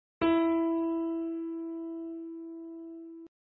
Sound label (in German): Ton, Klavier (einzelne) Töne